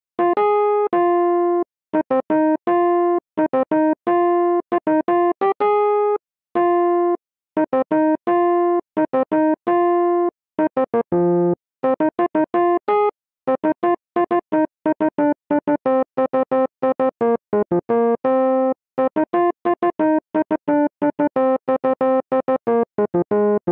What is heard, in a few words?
Instrumental; Saripodhaa Sanivaram